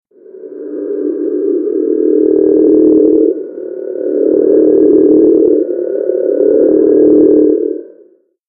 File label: River Frog; herps of NC; anurans